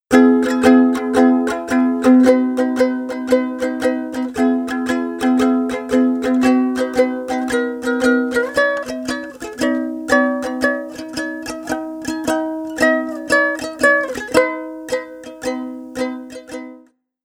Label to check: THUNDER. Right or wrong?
Wrong